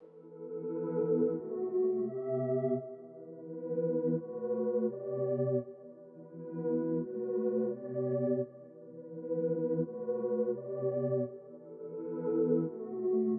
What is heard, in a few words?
170 BPM E Min Main Keys, loop stems; Cymatics; 2022 Melody Collection Platinum Expansion; Touche